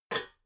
pots stack soft

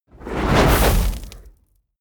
whoosh fire sizzle small

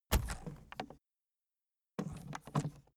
aluminium case handling

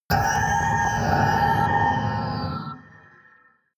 hydraulic howl

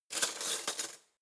cutlery spoons drawer grab

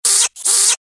ducky monster

